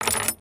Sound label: chain; creatures organic